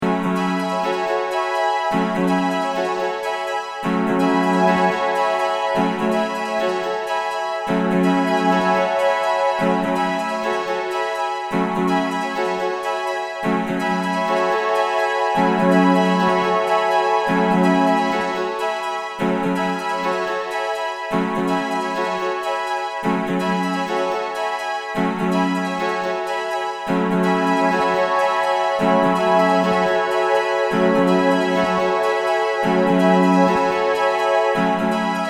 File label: LFO CC EG